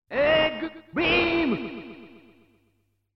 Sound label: Egg Beam!; Divertimento Hanjuku Hero